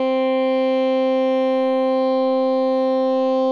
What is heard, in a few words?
hoo！